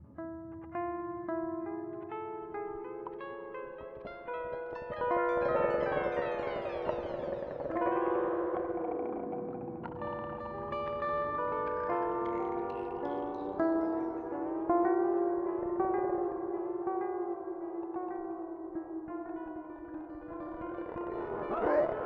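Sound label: Avalanche Run V2 Stereo time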